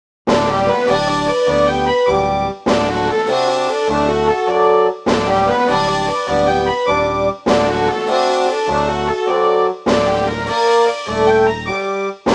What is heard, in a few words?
fanfare